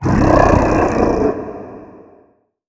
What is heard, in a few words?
monster; MonsterVoiceお手軽パック